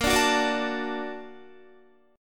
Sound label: A sharp Augmented Major 7th A sharp Guitar; A#+M7 strummed; JGuitar